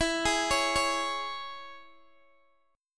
E 6th, down, Guitar, DADGAD

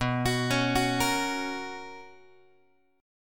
B Major 7th Suspended 2nd Guitar, chord; BM7sus2; arpeggiated; JGuitar

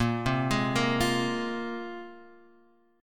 A sharp 7th Suspended 2nd, Guitar, Standard; arpeggiated; 6,3,6,3,6,x; chord; JGuitar

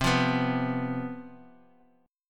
C Major 7th Suspended 4th, C, down, chord; strummed; CM7sus4

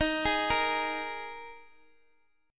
D sharp Suspended 4th, Guitar, Open D

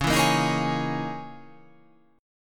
C sharp Minor 6th Add 9th C sharp

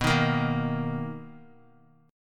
B Major 7th B down; strummed; JGuitar

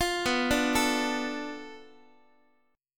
B-Minor 7th Flat 5th B down Guitar; Bm7b5; arpeggiated; x,x,8,9,6,8; JGuitar

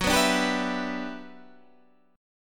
G Major 13th

